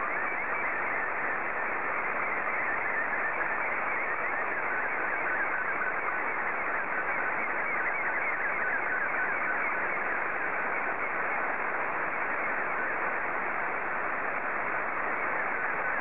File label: sstv